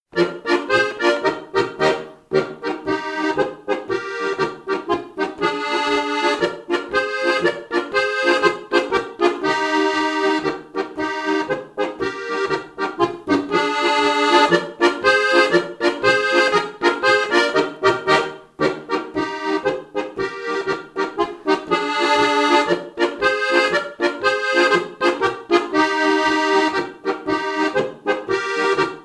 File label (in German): Sternpolka Es